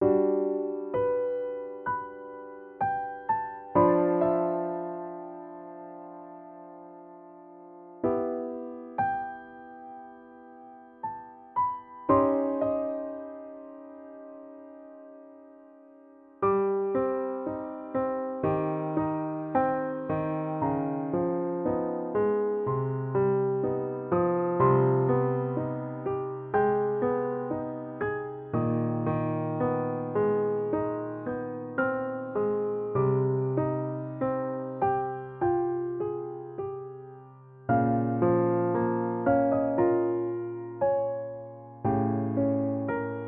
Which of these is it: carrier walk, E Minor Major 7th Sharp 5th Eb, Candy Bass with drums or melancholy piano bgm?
melancholy piano bgm